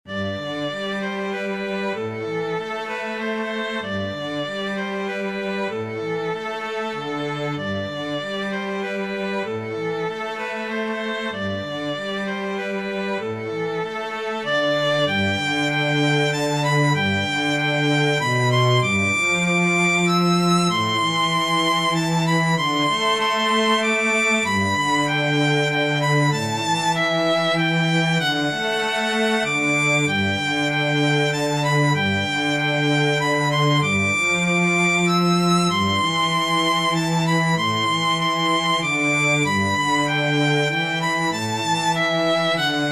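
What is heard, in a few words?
duet violoncello and violin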